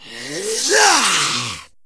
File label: Attack; Ionar